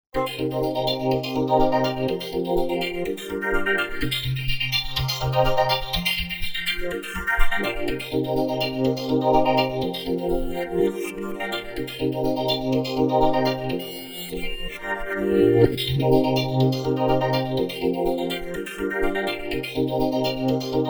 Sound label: arpeggios